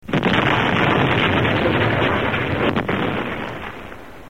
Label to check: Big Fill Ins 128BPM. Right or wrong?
Wrong